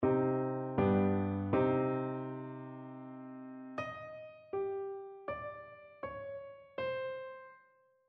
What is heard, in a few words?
melodic fragments